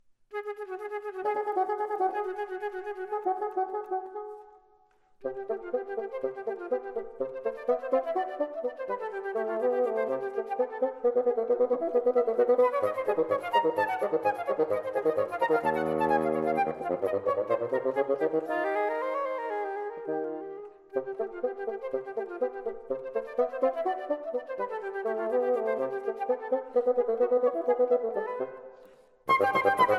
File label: Petite Suite For Flute & Bassoon, Intermede